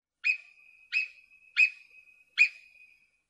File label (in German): Murmeltier Marmota marmota; Tierstimmen